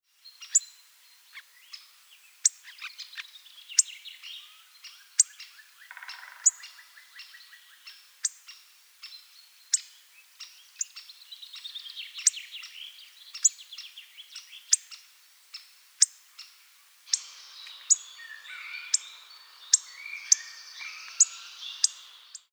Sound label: Coccothraustes coccothraustes